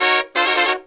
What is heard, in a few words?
Phantom Horns, 132BPM; Zero-G